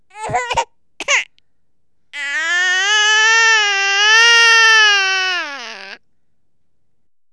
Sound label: BABY CRY